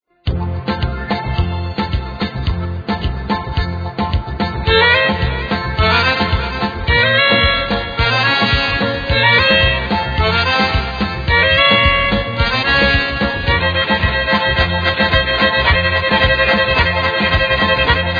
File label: Double violine